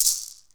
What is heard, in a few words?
PUILI, HAWAII PERC; AKAI S6000 CD-ROM; WorldSounds